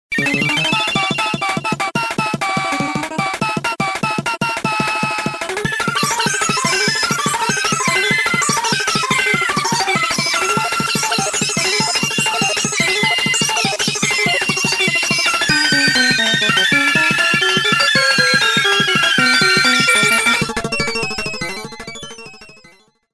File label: crawling